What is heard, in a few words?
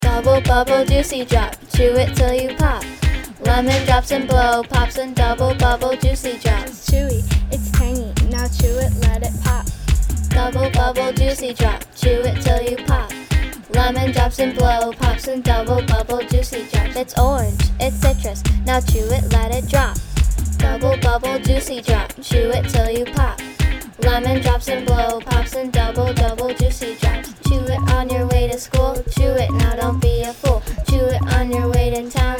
Bubble Bubble Juicy Drop; Frontier Middle School